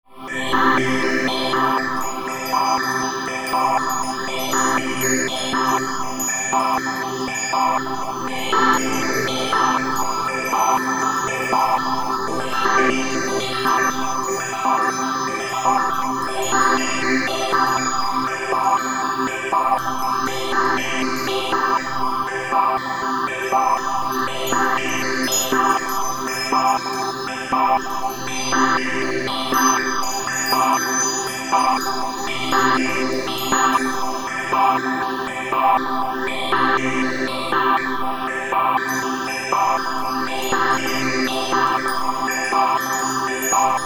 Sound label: rhythmic spinkle pad